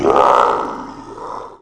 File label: death; Alien Arena; xenoid